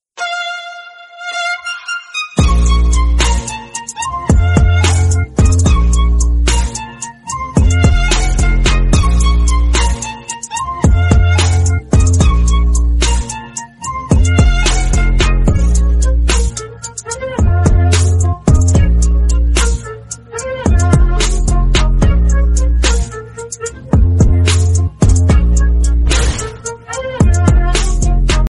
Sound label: awesome classical background music tone ringtone